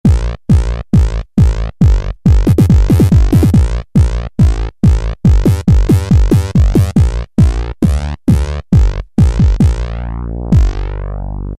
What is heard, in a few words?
Bass Saw Bass, E-mu Morpheus; Factory Presets